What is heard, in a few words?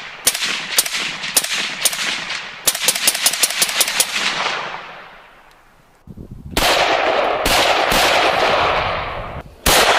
gun shot